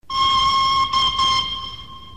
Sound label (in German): Dampfpfeife; Soundeffekte; Klingelton